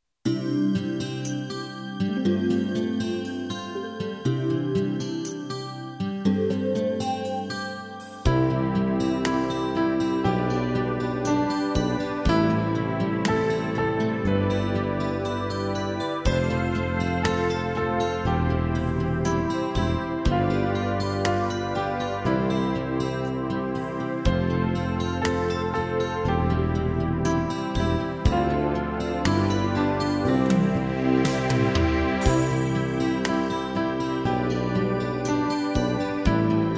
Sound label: Flute; Dziesmiņa ar fonogrammu